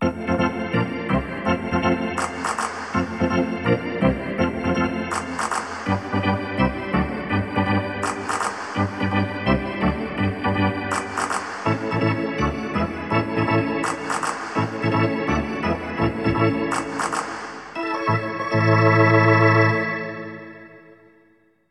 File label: Hockey Organ